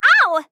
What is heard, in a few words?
grunt hit; Paladins; Ying